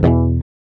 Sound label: GUI E, Acoustic